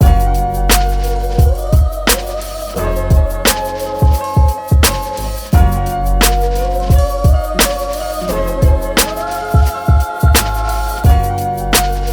Instrumental

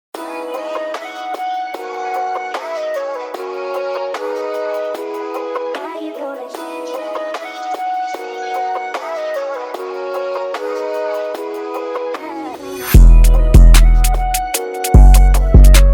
Instrumental

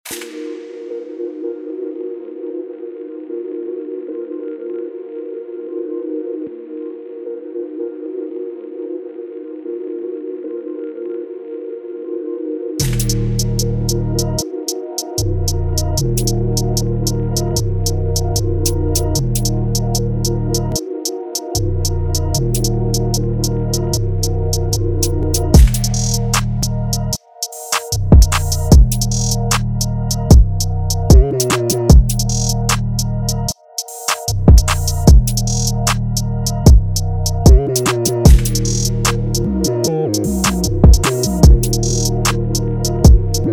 Instrumental

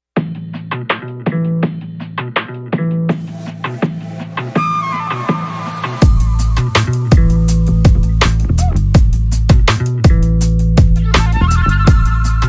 Instrumental

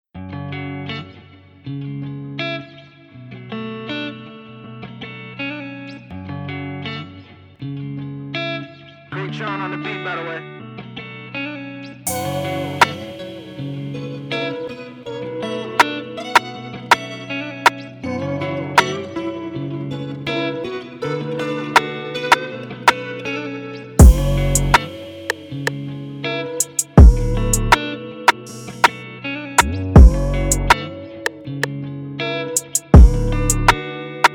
Instrumental

